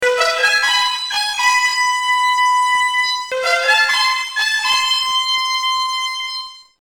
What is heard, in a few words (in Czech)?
Fanfara